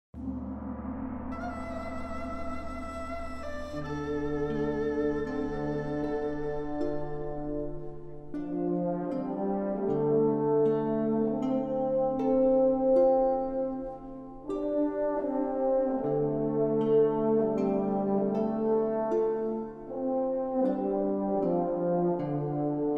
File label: Music